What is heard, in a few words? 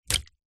cartoon slime gloop drip land on wood